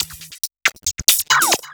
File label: percussion loops 138; PS-45; Techno